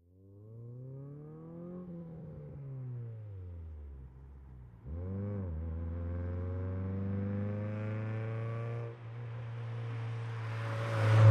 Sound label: Integra, long distant. approach, medium speed by, long away, Acura Integra; acura integra ext.